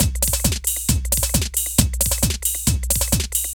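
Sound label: drum loops, right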